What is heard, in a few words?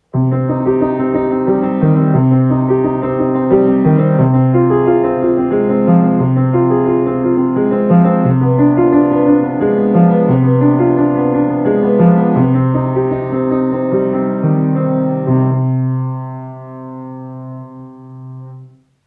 Little Prelude in Cm; Bach